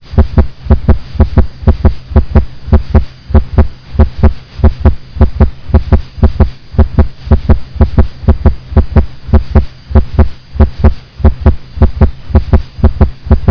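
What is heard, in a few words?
Auscultation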